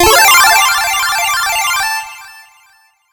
Highscore Fanfare; Mega Overload VR

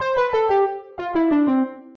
Fail Sfx

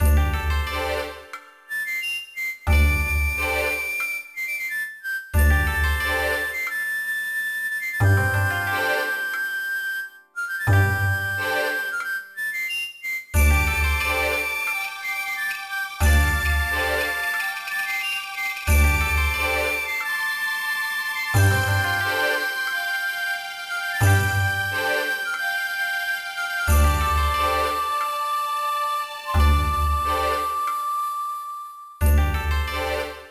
BGM Menu; Touch de Tanoshimu Hyakunin Isshu DS Shigureden

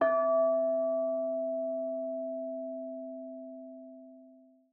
amb harbour

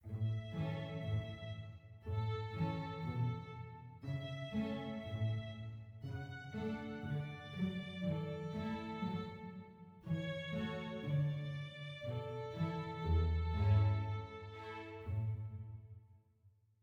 happy soft

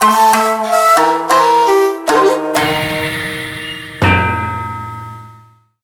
BGM lose; Shuriken and Aliens

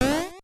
Retro

